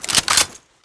boltpull, weapons; m14

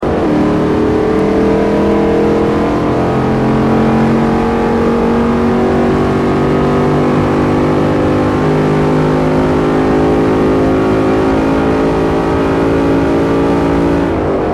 third, vehicles charger srt8

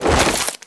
cloth, weapons; masada magpul

